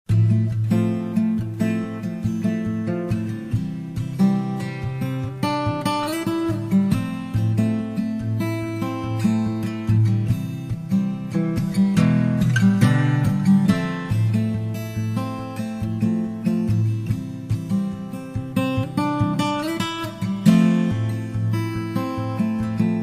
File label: Kick